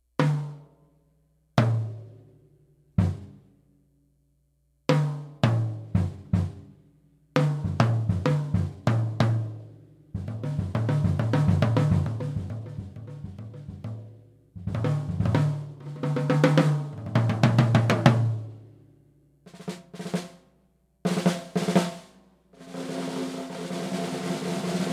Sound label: Gretsch Blue Sparkle